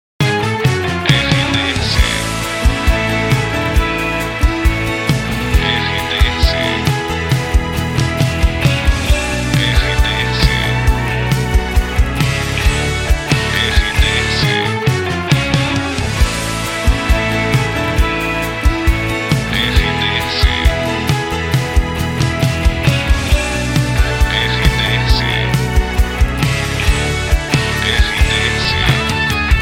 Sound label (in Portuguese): trilha para locução; Rádio RBC